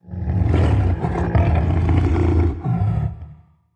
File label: damage; Barotrauma; Charybdisold